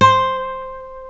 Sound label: C NYLON, Guitars